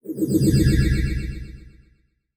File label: Multimedia Internet CD-Rom Flash Whoosh Multiple